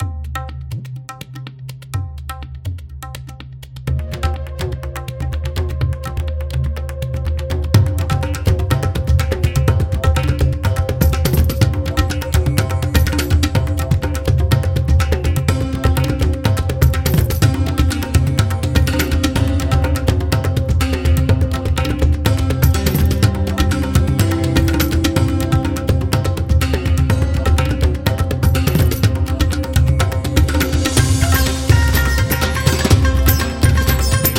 Traditional India

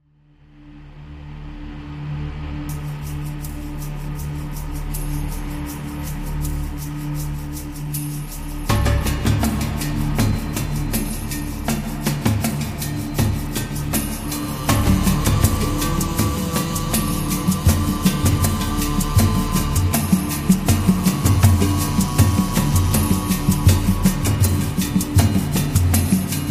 Spiritual Dance

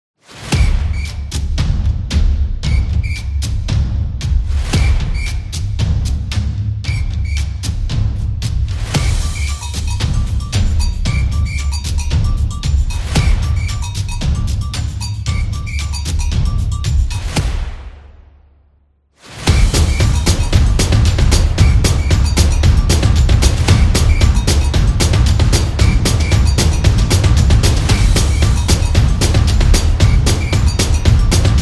Soccer Drums

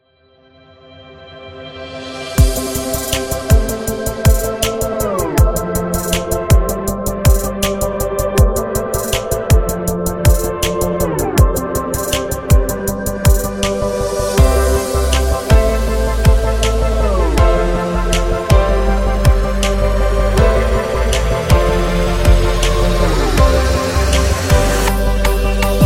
Happy Beat; corporate background

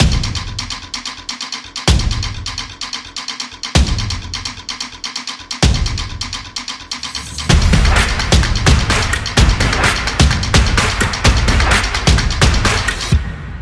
Drums

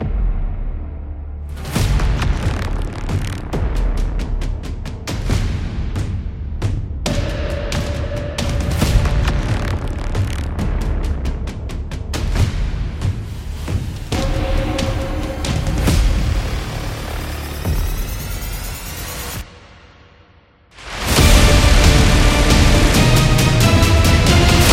Mad Drums

